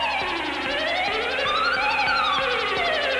HOVER

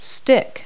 stick; Multi-Lingual Talking Picture Dictionary

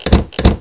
ROCK

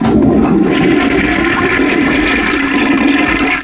FLUSH

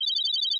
graph

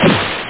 waterball

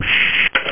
lock rev

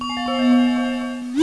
piece vanish; Deco 1.0

